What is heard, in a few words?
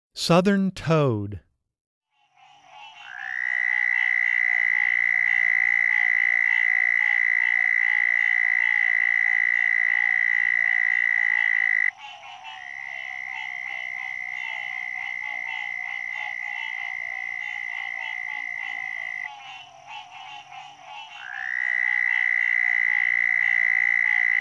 Southern Toad